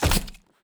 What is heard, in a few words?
fly walk